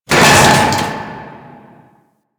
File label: Intermediate Coring Metal Bin Stop A; Warframe; Ambience; Grineer; Grn Intermediate Coring